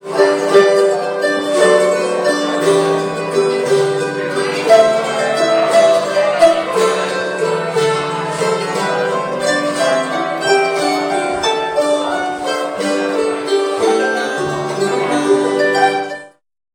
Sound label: band